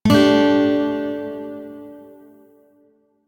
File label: Shawzin Pent Maj Chord H; Music; DE; Warframe